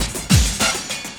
loop, 200 BPM; Ueberschall; Drum & Bass Resonance

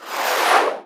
synth ship, Science Fiction; E-MU Producer Series Vol. 3 – Hollywood Sound Effects; Columns

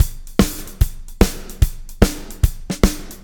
POWR, Backbeat, SHUF

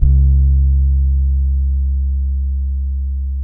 SAMBA, Bass

